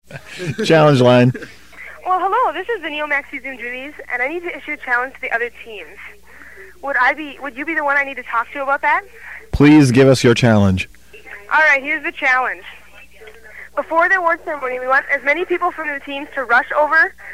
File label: Polka